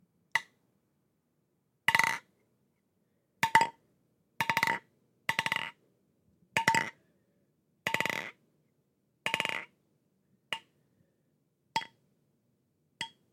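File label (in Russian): по металлической крышке бутылки ударяют палкой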